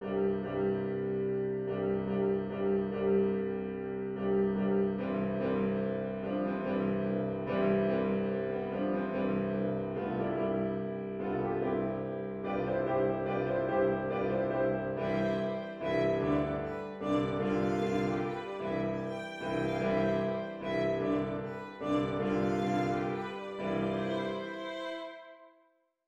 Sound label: Mazurka, Polish Themes